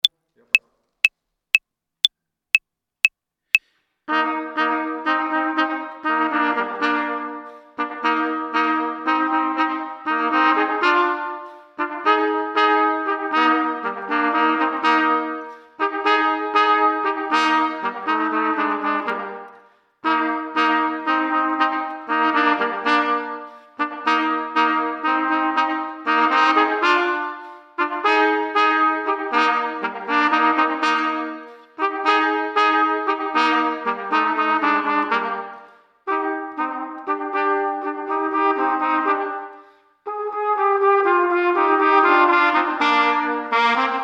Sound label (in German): Mini ROCK 1. Stimme playalong